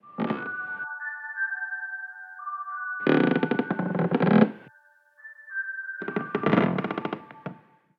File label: Amb; inbento